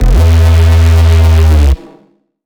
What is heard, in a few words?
Dubstep Bass One Shot E; Vital